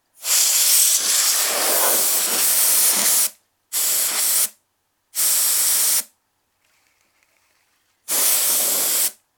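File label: spray; PC用フロスター音（途中振る音あり）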